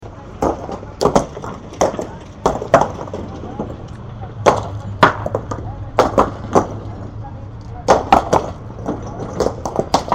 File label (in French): trois hommes lancent du haut d'un camion de gros pavés et le vide